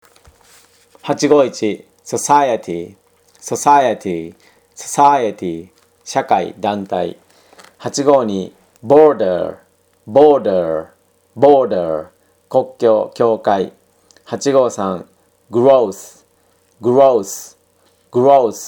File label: tango